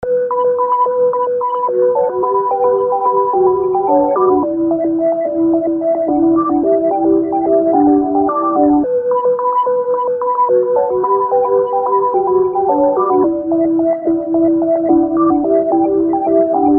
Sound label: 109 BPM